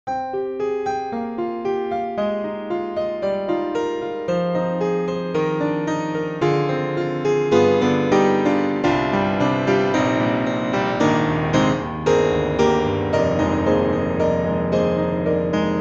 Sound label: Pianoteq Grand M1 rock; MODARTT S.A.S.